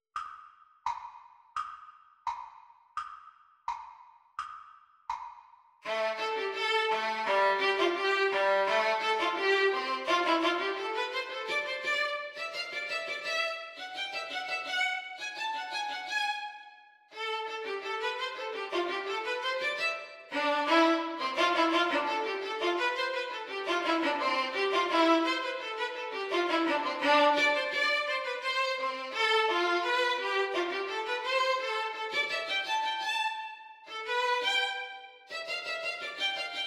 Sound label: Mandolin Duet Exam Speed